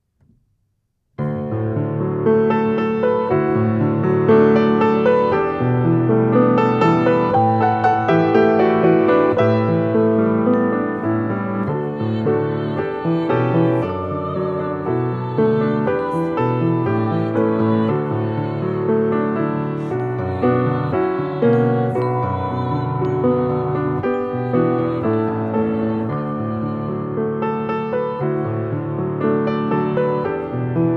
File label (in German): Lieber Sommer ohne Gesang